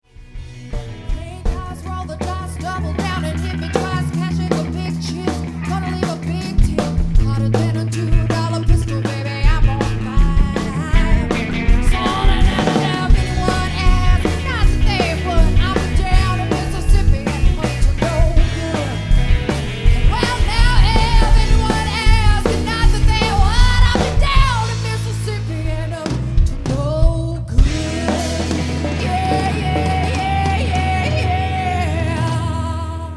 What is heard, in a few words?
Live, Cover Band